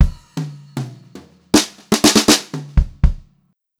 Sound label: FILL, Brushed Drum Loops; USB Soundscan vol.39; AKAI